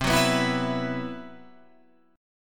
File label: C Major 7th Flat 5th C, Guitar Drop D